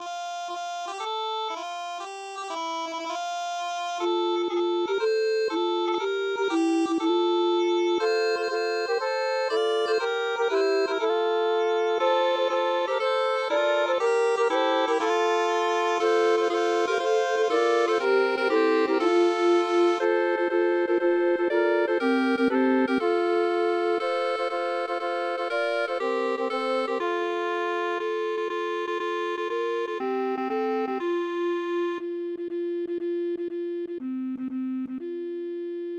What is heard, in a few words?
daybreak canon